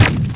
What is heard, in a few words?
PULSE; lander